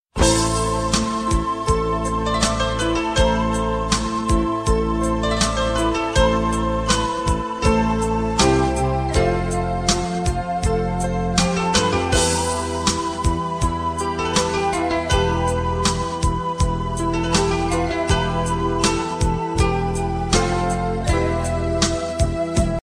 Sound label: Romantic Ringtone